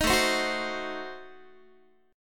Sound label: A Diminished 7th A down Guitar; strummed; Adim7